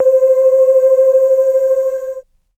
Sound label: Modular Nostalgic Space Vinyl Synths C4; vinyl keys